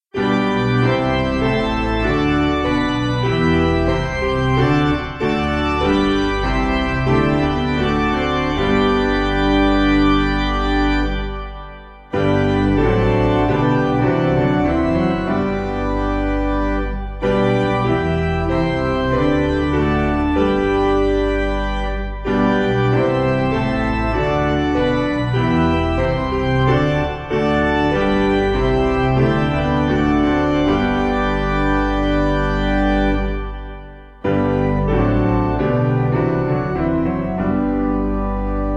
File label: Piano